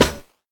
short reverb snare single hit D# key, Royality free acoustic snare tuned to the D# note; snare drum samples